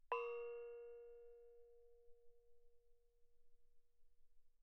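thai gong Bb4 mezzo forte, Percussion gongs & tamtams; thaigongs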